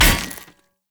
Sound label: Sphere Polearm Medium Impact Flesh Armour Hollow; Weapon Impacts; Player; Combat